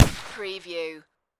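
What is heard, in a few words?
Pistol Ruger 357 Single Shot Designed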